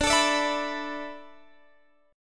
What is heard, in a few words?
D 6th Suspended 2nd